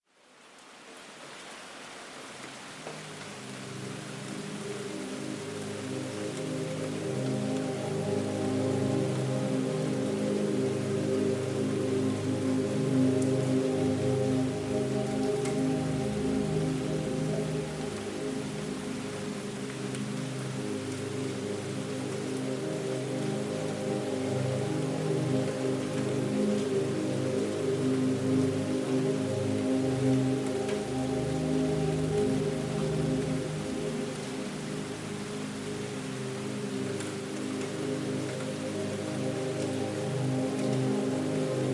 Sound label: happy dreamy break during rain with white noise